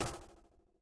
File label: stop